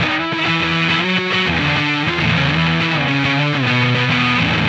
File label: Dist Gtr